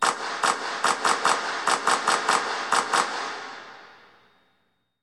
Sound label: Hockey Organ Claps